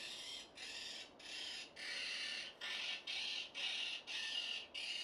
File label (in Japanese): inko